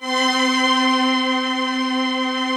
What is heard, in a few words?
STR, YAMAHA, Analog; Analog Fantasies; USB Soundscan vol.49; AKAI